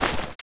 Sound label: pp1901 draw cloth; garrysmod